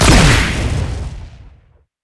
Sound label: grenade; rumble skin03